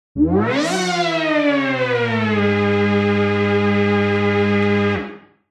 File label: lead hoover gabber